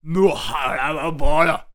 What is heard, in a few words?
Human Evil; little robot sound factory